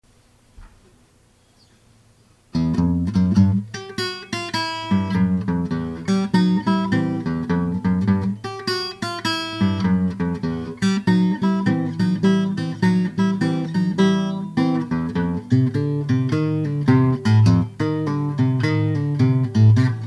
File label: black horse blues